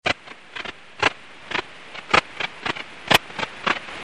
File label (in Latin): pholidoptera griseoaptera; Heuschrecken; insekt; wirbellos